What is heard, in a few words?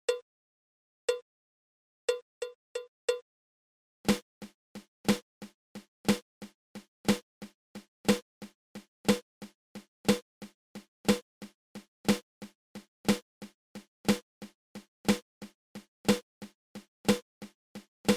Flam Accent